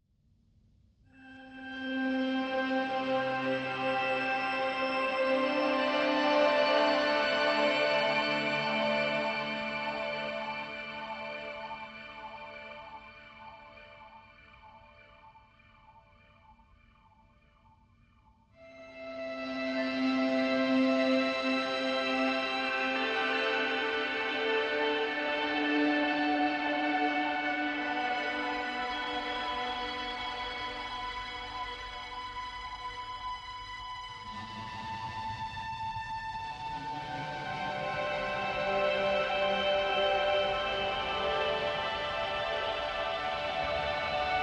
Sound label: Ambient Mix